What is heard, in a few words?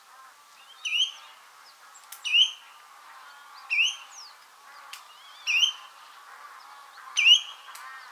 Spotted Redshank, Dunkler Wasserläufer